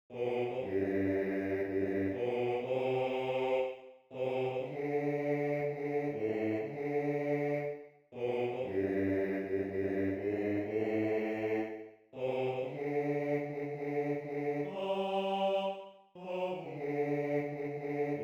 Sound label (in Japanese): 団歌4声 Baritone